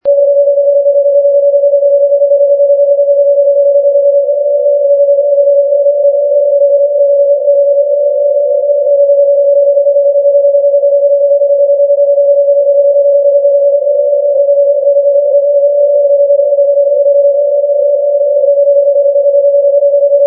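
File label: non-directional beacon; europe; ndb zitorada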